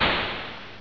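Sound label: water out; sonicnew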